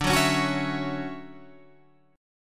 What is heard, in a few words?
D-Major 9th D down Guitar Open G